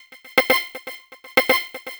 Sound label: Techno Synth